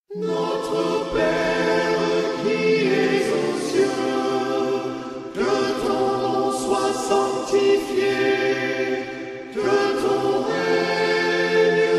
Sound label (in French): Notre Pere chante; Nouvelle traduction liturgique 2017